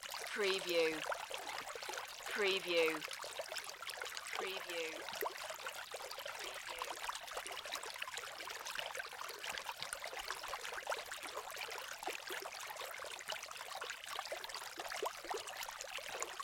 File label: Creek Small